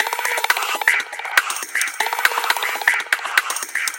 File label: Beats, ringtones; Lenovo P700i